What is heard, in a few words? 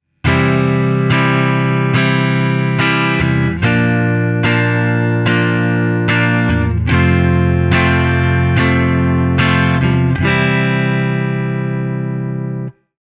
guitar chord